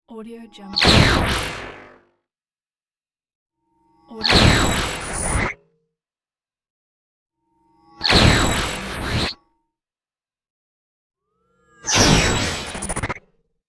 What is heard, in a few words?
laser weapon burst